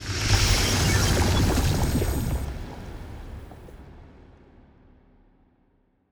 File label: ship science ship move; Stellaris; toxoids